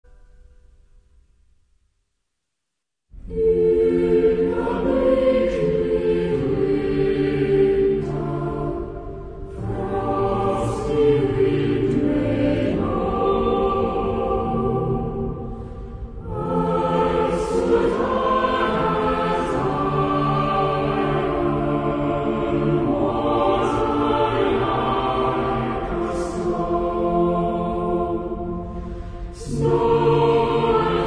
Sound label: Cathedral Choir